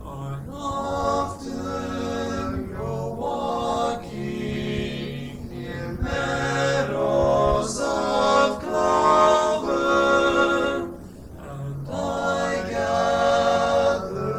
I Often Go Walking TTBB, Gentle 4-part men's arrangement